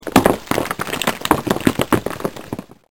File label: rock fall